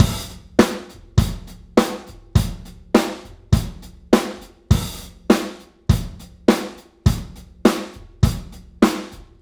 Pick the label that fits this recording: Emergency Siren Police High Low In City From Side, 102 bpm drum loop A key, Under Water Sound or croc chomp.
102 bpm drum loop A key